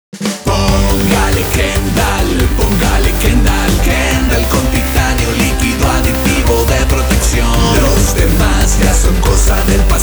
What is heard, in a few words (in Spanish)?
Jingle; Kendall 2017